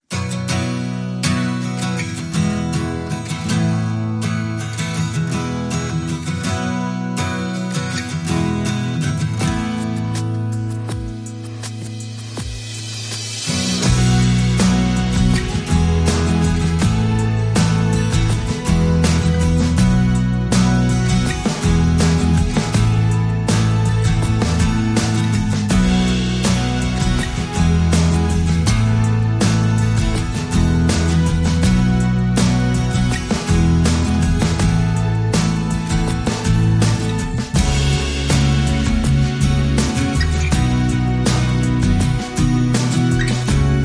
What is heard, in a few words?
No Electric Guitar